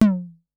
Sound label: Analog SY1 Tom